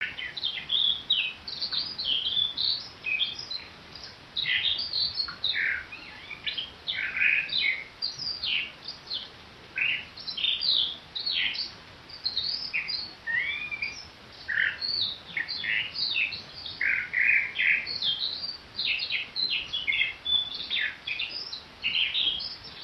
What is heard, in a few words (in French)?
chant alouette